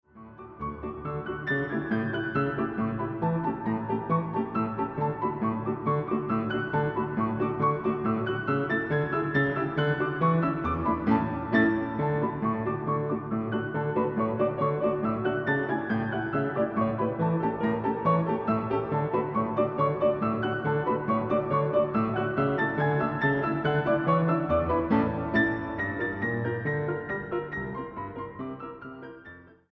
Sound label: Galop